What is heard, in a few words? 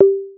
default beep, phonetones Nokia N900